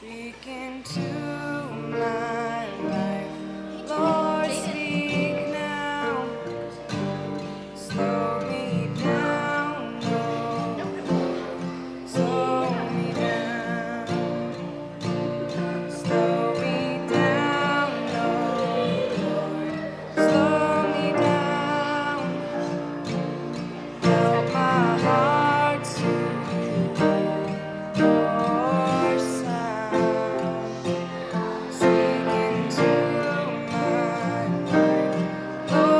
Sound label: solo